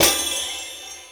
DROP LOOP, percussive

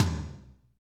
TOM, KIT Real; Real-Kit s12

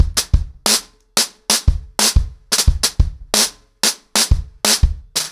90BPM, ACOUSTIC DRUMS

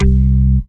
DM ORG1, Dance Mega Synth

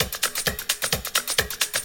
130 addon, DRUMLOOPS, HIHAT; Ueberschall; Techno Trance Essentials

